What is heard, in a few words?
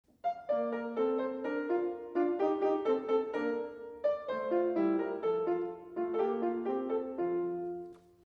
Symmetrical opening; Inside Mozart K3